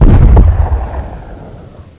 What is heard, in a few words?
boom; raydium